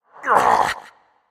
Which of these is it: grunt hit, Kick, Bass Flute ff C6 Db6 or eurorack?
grunt hit